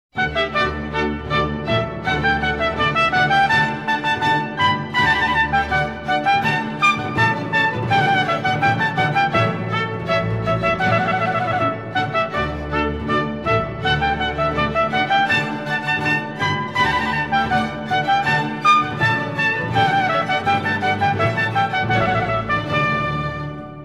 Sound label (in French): Fanfares rondeau; Mouret; Chefs d'oeuvre du Baroque